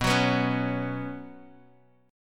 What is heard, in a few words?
C sharp 7th Suspended 4th B